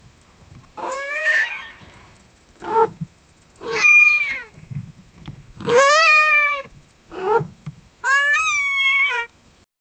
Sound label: cat movement scared yowls